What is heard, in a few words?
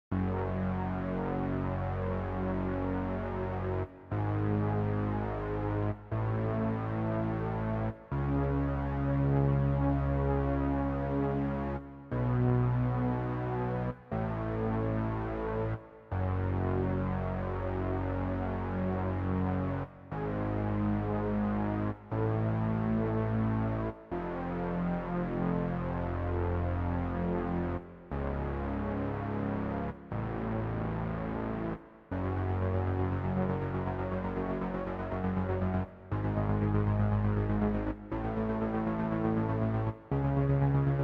BASS